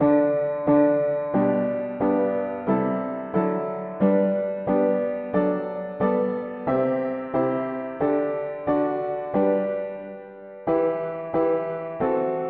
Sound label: mono piano, hymns; Jesus Thou alone art worthy